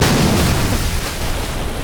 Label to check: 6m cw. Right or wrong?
Wrong